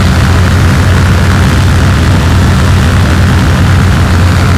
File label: an2 side, engines; War Thunder